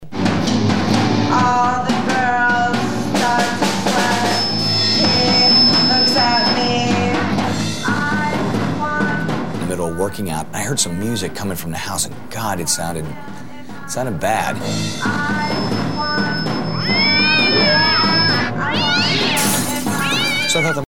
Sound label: song